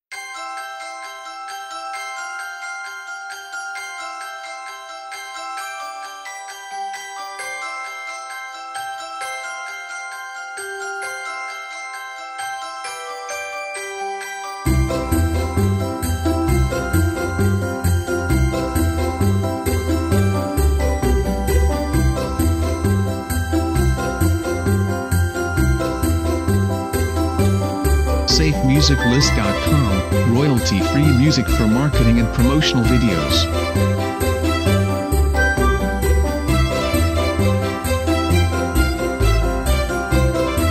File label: Christmas Fair